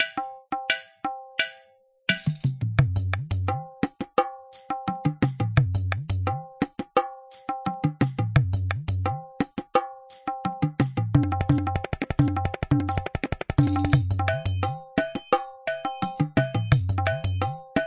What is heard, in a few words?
folk, groove